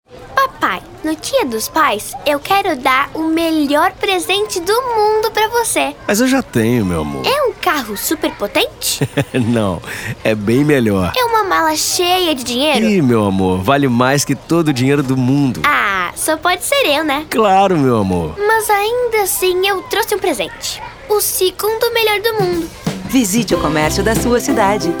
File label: jingle; Dia dos pais